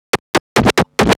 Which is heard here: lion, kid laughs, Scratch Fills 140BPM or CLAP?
Scratch Fills 140BPM